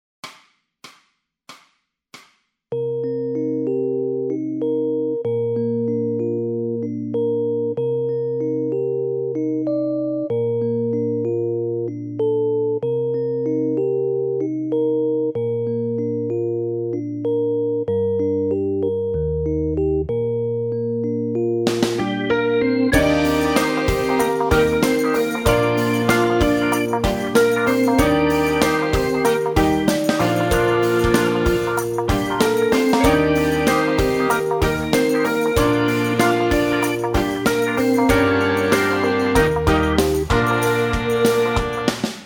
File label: bass blues funk, backing tracks